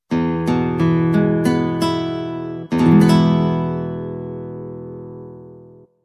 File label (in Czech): Akord E dur